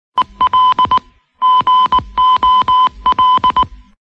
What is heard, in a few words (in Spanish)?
alarma de gol; carrusel deportivo w radio chile